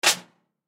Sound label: Drum Snare; Snare Drum 14 Inch Throw Off Turn On; Drum Kit Sound Effects